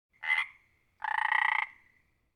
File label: Frog croaking